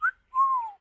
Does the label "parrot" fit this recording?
Yes